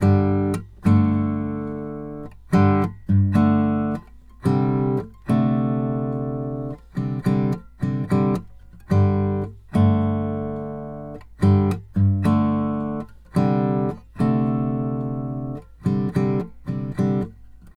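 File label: Country Guitar Intro; Digidesign; Pro Tools; Pro Tools Essential Demos; Essential Loop Demos; Demo Session - Country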